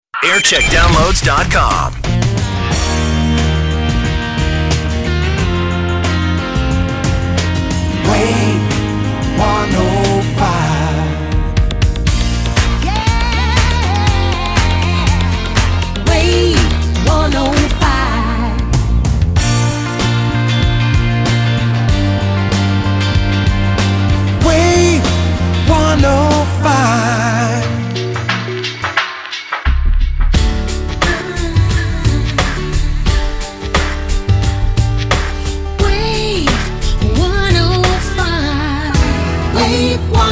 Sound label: jingle montage; Wave 105 Dorset/Hampshire; Jingle Montage (Vibe Music) 2002; 2000s